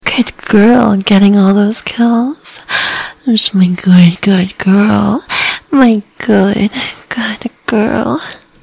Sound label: music stinger; killstreak music